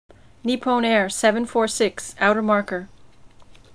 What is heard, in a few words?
landing, airplane